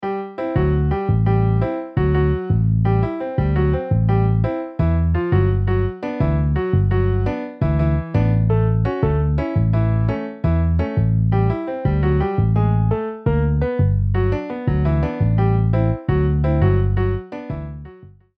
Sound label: Piano Bass MIDI; IRE PRODUCTIONS